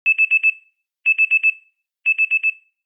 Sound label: Morning Alarm; Gamerip